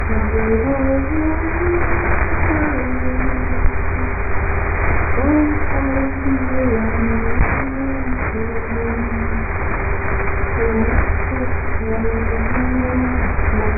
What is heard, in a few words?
8000kHz